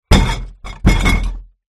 household trashcan recycle card glass tins movement bang